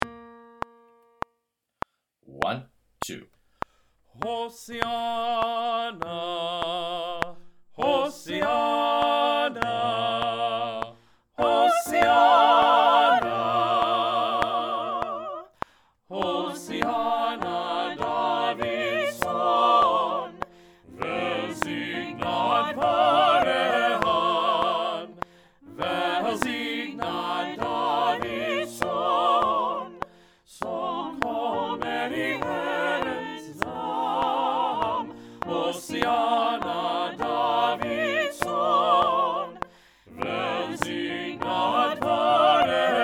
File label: Hosianna All Parts CLICK; hymns